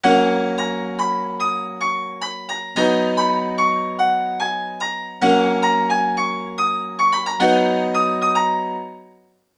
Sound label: Keys Higher